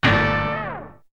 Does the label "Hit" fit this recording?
Yes